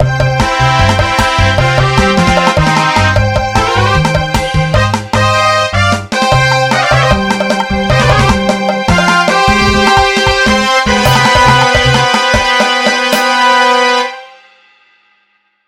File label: Victory Fanfare